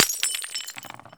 small glass, bullets impact; hl2

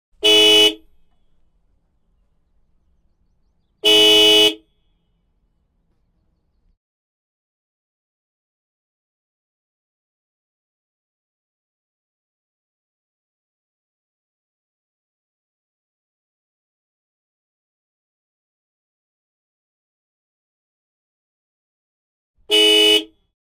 Man Lions Coach - Horn Various; Pole Position; The Vehicle Doors and More Library; VAR SFX

